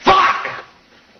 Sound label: YELLING; amnesia: the dark descent; madness archive; madness-ch03-demo; enemy; hater